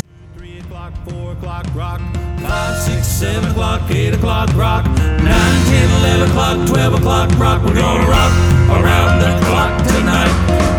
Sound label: Happy Rhythm; CarryOn